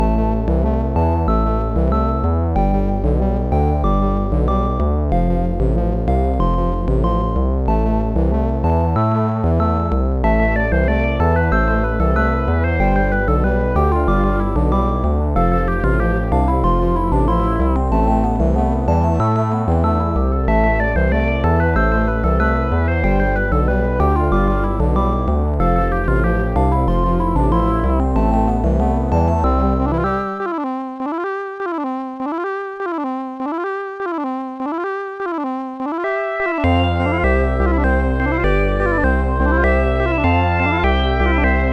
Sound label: strange beats; MusicLine